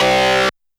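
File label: Guitar Power Chord H; YAMAHA RM1X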